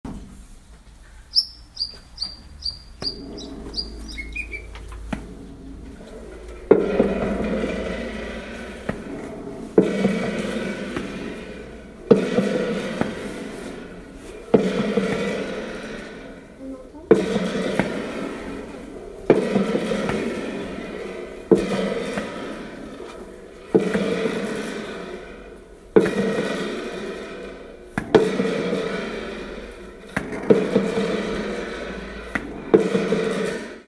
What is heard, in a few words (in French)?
Boites a orage